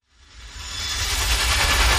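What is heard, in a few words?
Reverse Steep Edges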